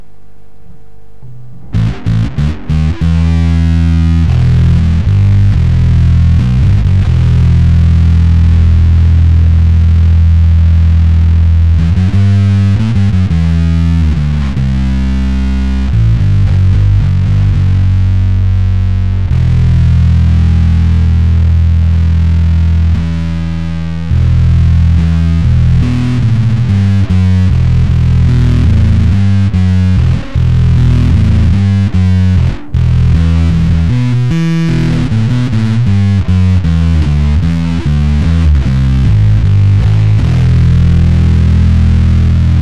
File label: tonebender riff